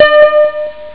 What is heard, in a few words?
d50 bell voice; steel; Amiga Soundtracker Sample Packs